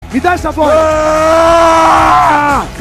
grito da masculinidade

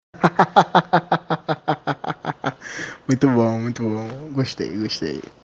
risada

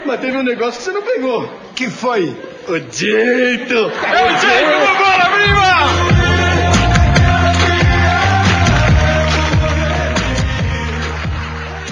rir

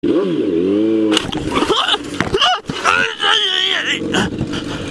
acidente com yamaha r1; tchokozzo

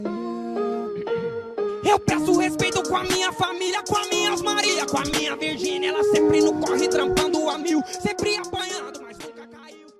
rap

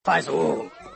faz o urro

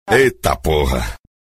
vinheta

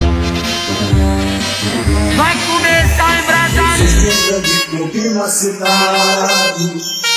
hino do cruzeiro versao funk

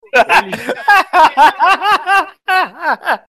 risada

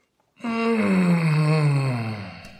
gemendo

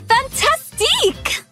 viola; fantastique

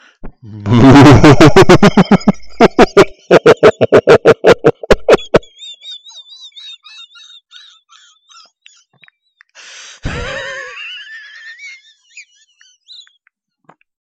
hiena

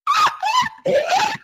grito

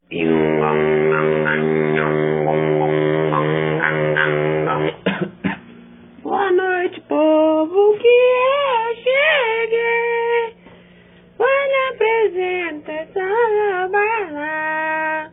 som de porco

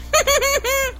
risadinha

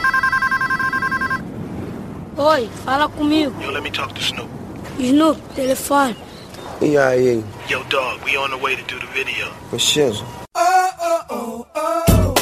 telefone; snoop

